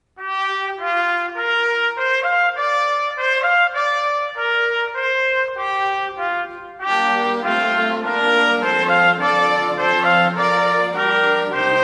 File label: Promenade String Quartet 2 Trumpets; Moussorgsky; Classical